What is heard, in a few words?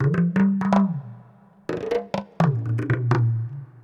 PERC LOOP ETHNO 125; eJay